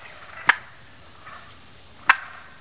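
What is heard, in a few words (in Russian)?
индейка шумный далекий спокойный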